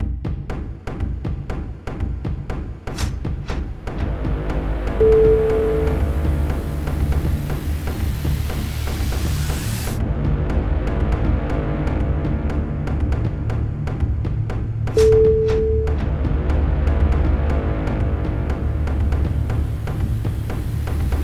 tribal darkness

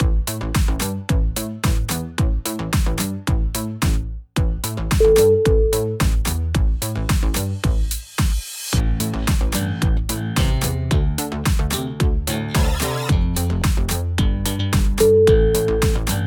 synth vision

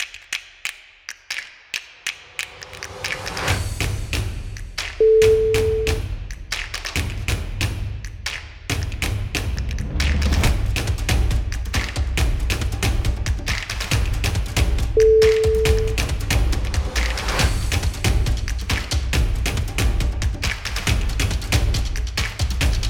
stomp groove

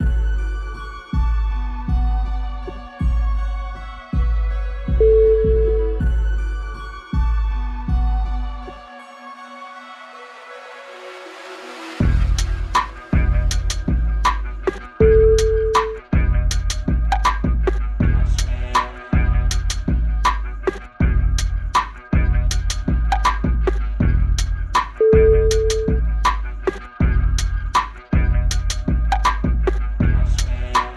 slow tripping

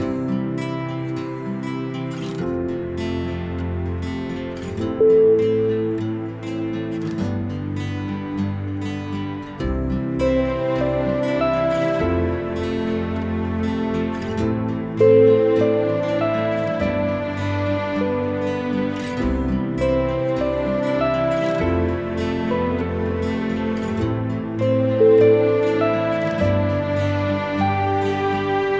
acoustic beauty

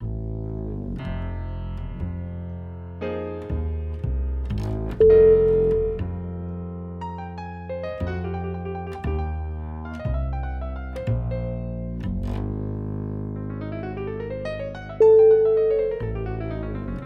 deep blues

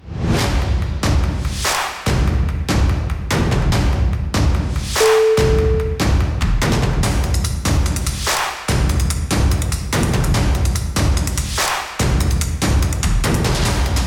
drums of war

